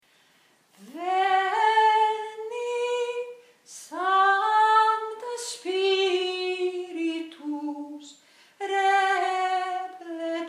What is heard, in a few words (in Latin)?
Veni Sancte Spiritus bas